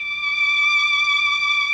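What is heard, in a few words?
Mellotron strings; Sonic Reality; Vintage Timetraveler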